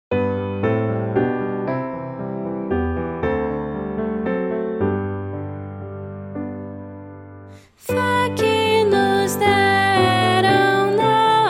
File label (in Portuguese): voz piano